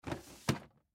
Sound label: Drawer Close Plastic Filing Cabinet; Drawer Close Plastic Filing Cabinet Storage Bin; Office; Drawers; Blastwave